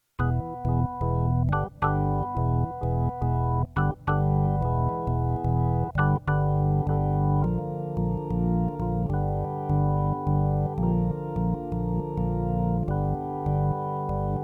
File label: organ prelude